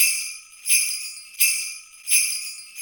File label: Sleigh Bells; Sonic Foundry (Sony Creative Software); Joe Vitale Latin Percussion